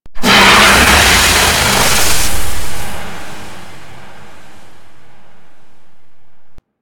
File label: Golem Death; Warframe